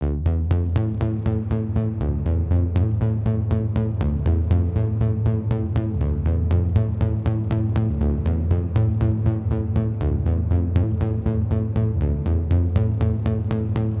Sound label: Riffer Bass